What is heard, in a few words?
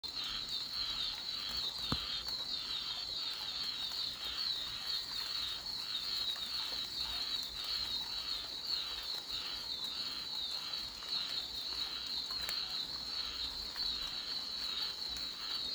Frogs